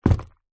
foley footstep single shoe business leather buckle zipper womans on wood floor